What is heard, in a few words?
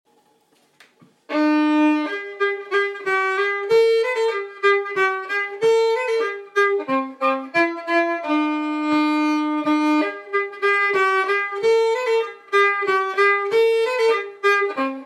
Clarinet Polska